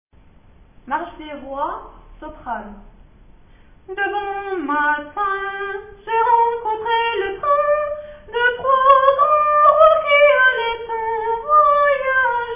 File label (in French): soprano; Marche des Rois